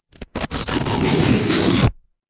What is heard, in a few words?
paper fabric tear